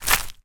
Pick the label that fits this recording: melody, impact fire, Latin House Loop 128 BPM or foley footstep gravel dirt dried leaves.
foley footstep gravel dirt dried leaves